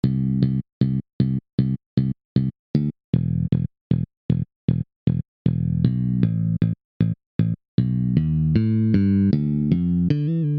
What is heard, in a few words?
bass basic